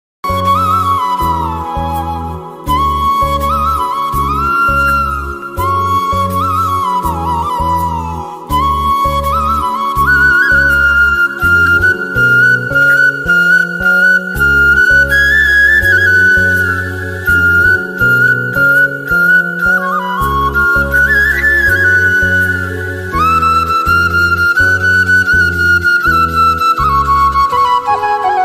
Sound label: flute ringtone; thalavattam